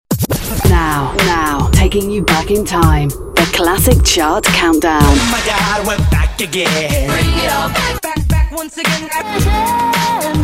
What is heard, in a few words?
Classic Chart Opener Generic